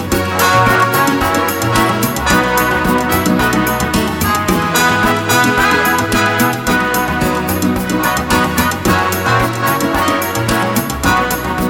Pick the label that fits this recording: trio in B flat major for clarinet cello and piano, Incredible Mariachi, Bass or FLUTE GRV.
Incredible Mariachi